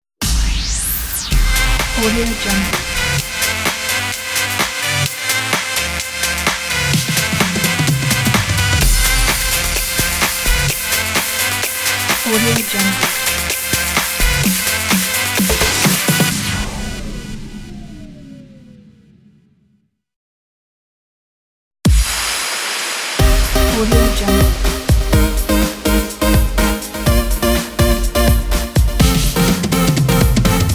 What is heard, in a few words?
dance logo pack